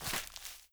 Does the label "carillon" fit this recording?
No